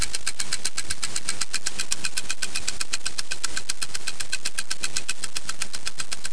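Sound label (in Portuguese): relogio, clock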